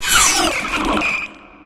sphere rail stop

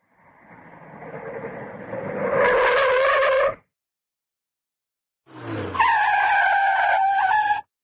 auto, skid auto approach and stop with squeal; cartoon

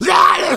hunter shred, attack voice

